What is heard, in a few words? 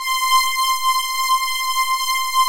BRASS PADS; Pro-Rec - Synthsonic